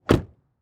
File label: Transportation Truck Envoy Close Door Exterior